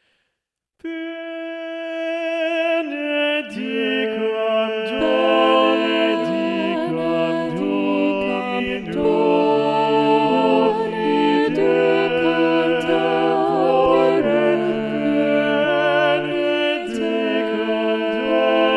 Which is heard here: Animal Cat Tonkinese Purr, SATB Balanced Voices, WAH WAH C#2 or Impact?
SATB Balanced Voices